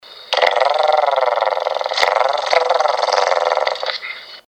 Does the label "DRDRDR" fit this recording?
Yes